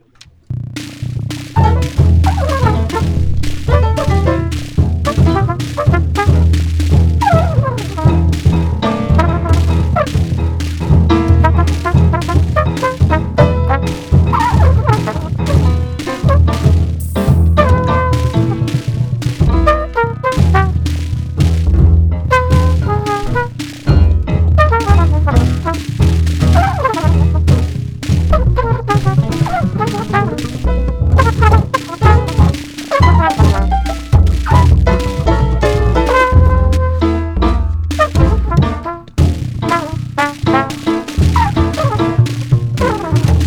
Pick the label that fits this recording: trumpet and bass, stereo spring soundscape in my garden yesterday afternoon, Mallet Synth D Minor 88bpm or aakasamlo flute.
trumpet and bass